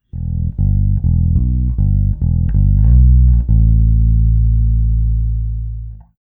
Smooth Bass

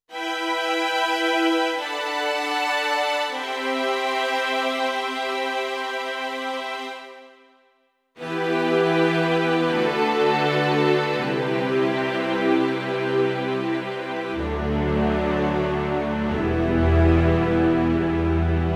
Artis SE Strings

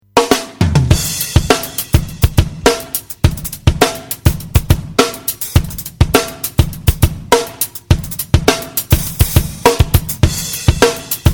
Natural Drums; ZEN

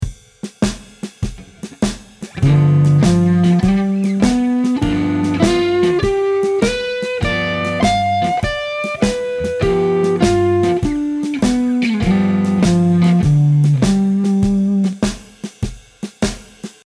G7 Arp high

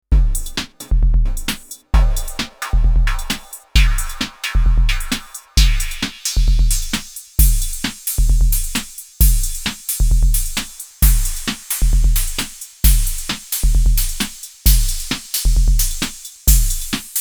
Mode Machines ADX 1 Metal

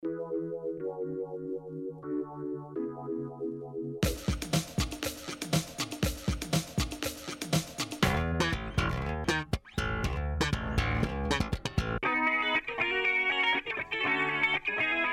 Fusion

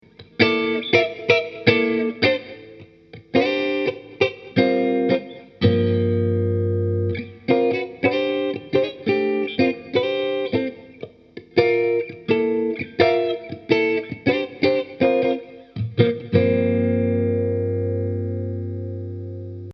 Funky Situation; MOD Devices MOD Duo

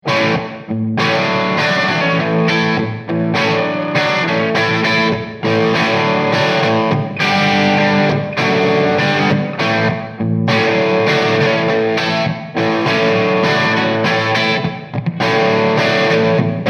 Small Studio Room

